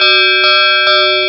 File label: warning bell; cstrike; kz ins trainrun